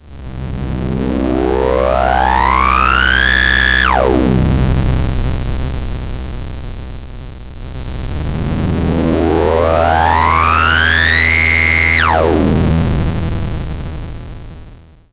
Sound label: Burping Monster